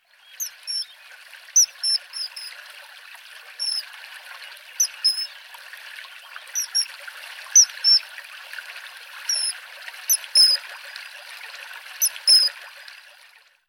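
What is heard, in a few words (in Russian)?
obyknovennyj zimorodok alcedo atthis, common kingfisher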